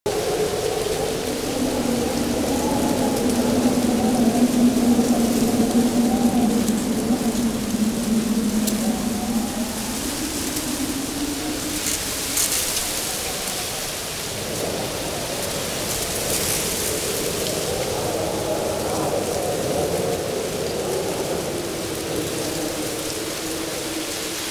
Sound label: Exterior Foreground Field Angry Jealous Loop; Ambience; Duviri; Warframe